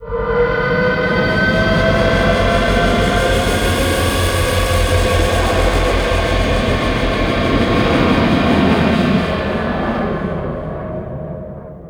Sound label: Level Undocking; Vehicles; Flight; Warframe; Liset; Nora Liset